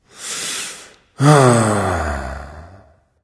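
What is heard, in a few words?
non-verbal sigh; smite; voicepack; houyi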